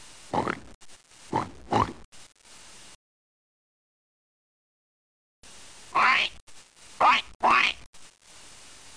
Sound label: Pig; Bible Fun Pack; NOAH